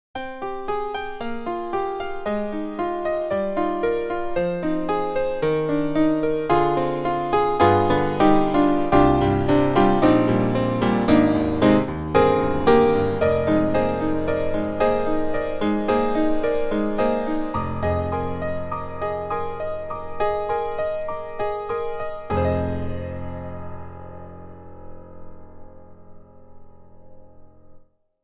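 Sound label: Steinway D; IK Multimedia; A Nice Stnwy D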